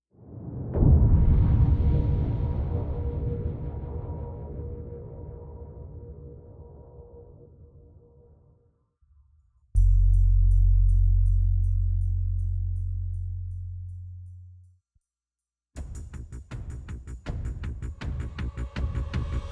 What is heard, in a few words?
percussion only